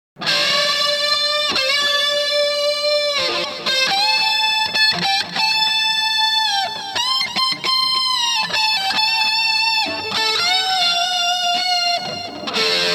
Power Boost Rams Head through Reeves low guitar vol